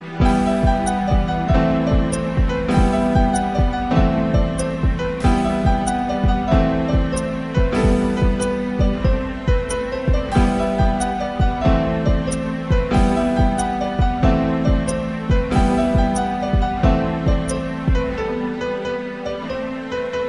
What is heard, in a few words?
background music; thiruchitrambalam